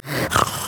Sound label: lizard effort; LoL; monsters